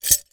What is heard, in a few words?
foley broken glass pieces inside glass jar place down carpet; zapsplat